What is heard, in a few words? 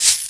money; angband